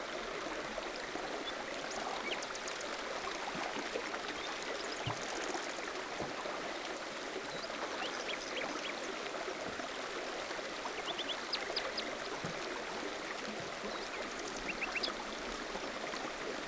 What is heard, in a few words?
Creek and Birds; Music; Soundscapes